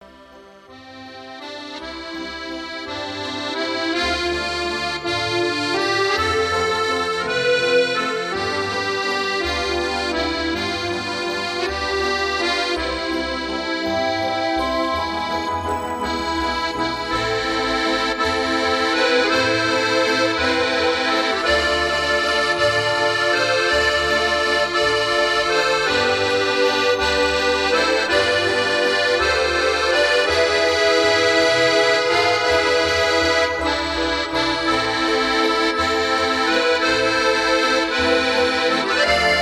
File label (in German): Musik